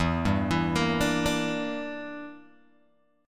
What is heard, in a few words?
E Minor 7th Flat 5th E, Guitar Open G